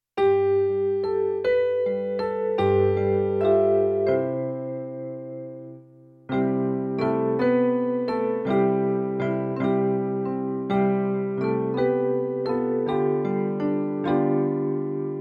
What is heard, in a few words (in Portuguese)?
playback hino